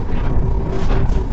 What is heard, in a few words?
pump; quake2